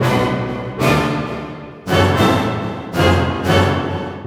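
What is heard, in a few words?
Orchestra Hits; Smooth Ride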